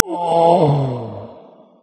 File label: die, mp actor